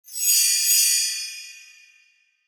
Shimmer Chime Swells Reverb; Production Element Imaging Element Accent Transition; Blastwave

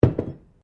Bottle Glass Place Bench

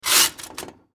Typewriter Paper Pull Out Antique; Historical; Technology; Typing

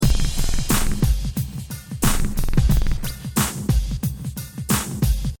Hip Hop Drum Loop 90 Bpm Swing With Crash; Drum Loops; Pneumatic; Royalty Free Drum Loops

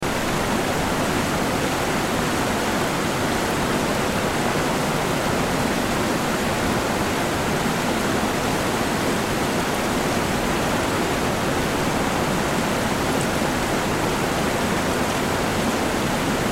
Water Dam Flow Over Loop; Dripping; Blastwave

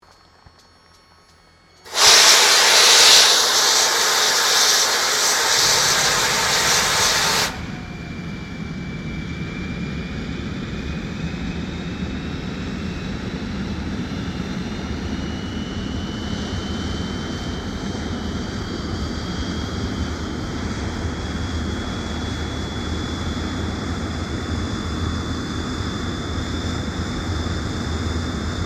AIRCRAFT CA-27 SABRE STARTUP; CA-27/F-86 Sabre; Airplanes; Vehicles

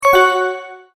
multimedia tone start; Sound Design

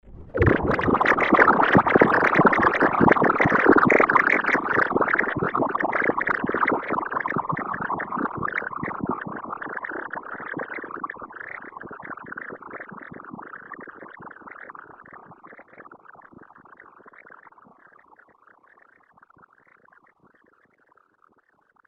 Bubbling Swamp Water; Water Sound Effects; fantasy sound effect; role playing games, magic videogames

